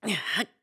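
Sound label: Lift, voice; Link; BOTW; Breath of the Wild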